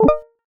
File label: neutral bi beep